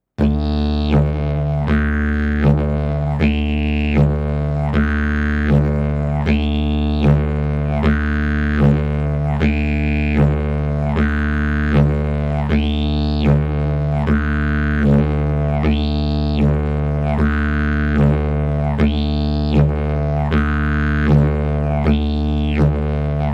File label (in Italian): ritmo livello principiante